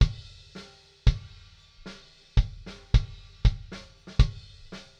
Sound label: Kik